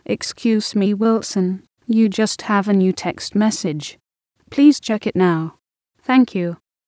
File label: Ska